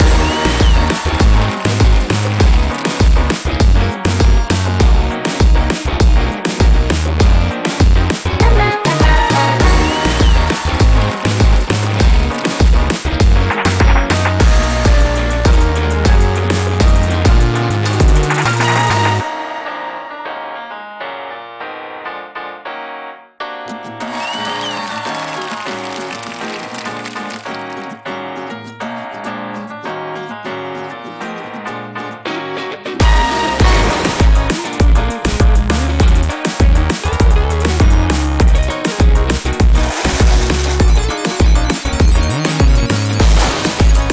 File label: bgm; event kick 2023